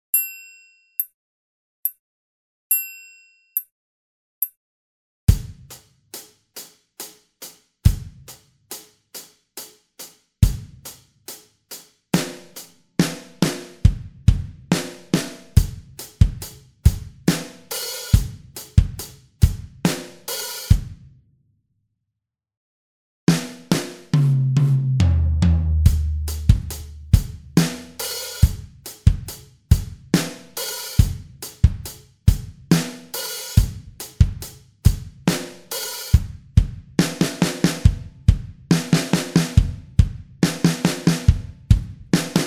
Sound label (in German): Walzerkoenig 1. Stimme (langsam); Trommelfeuer